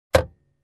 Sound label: Car Cockpit Click